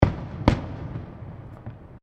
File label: Fireworks Multiple Firecrackers